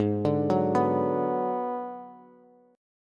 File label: Ab Minor 7th Flat 5th Ab, down, Bass; arpeggiated; JGuitar